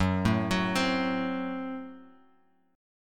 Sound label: Gb Diminished, down, Guitar, Open F; arpeggiated; JGuitar